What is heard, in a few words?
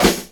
KIT, Dance Mega Drums, HIP; 90 s Sample CDs; Best Service